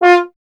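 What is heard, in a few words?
bass trombone F; Miroslav Vitous; Solo Instruments VOL-1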